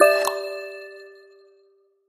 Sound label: notification